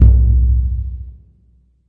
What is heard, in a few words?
big burundi, African Burundi Drums; ETHNIC and WORLD PERCUSSION